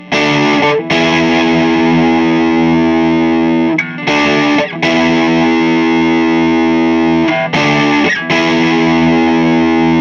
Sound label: ROYER 101